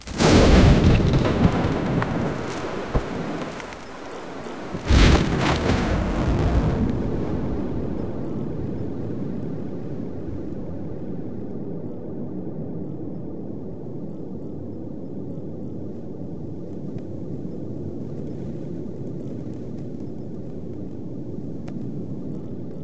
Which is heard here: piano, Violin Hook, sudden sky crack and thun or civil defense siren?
sudden sky crack and thun